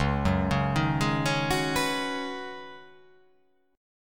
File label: C sharp 9th Suspended 4th C sharp down, chord